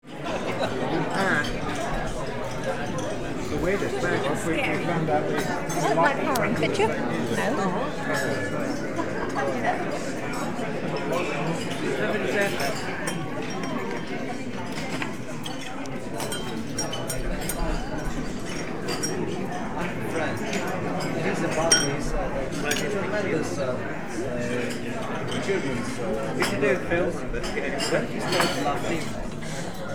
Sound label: Restaurant ambience large lunch time crowd